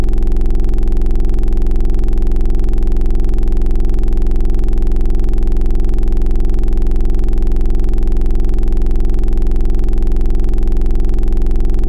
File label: Vocal Digital Mouth, Wavetables; Synthetic's Serum Collection; ESW Core Wavetables; Echo Sound Works Core Tables